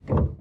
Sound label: piano release; samples-piano